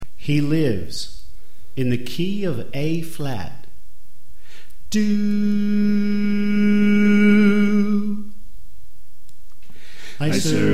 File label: Tenor Left